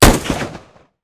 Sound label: Nitro Express Rifle Fire